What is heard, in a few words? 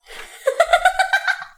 giggles and laughter; SyrinScape